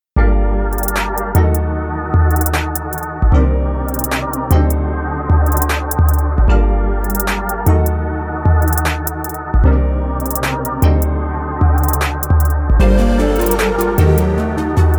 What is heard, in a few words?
dark trap; Forager